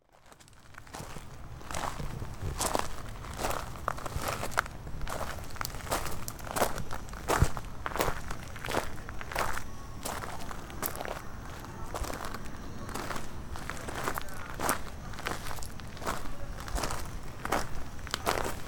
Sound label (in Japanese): jarimichi wo aruku; Chino Cultural Complex